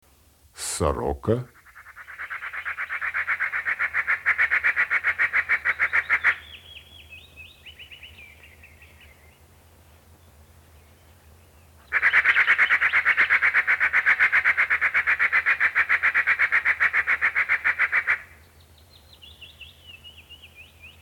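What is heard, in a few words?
soroka golos, magpie voice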